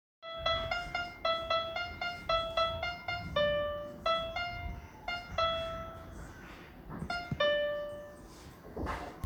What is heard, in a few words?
piano